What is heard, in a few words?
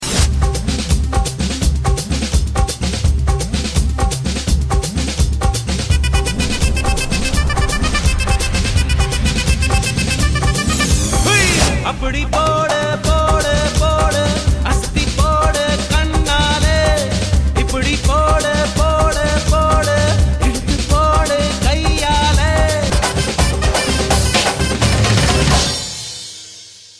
tamil song